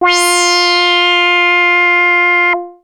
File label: Arp A F4, ARP Odyssey synth; musicradar-synth-samples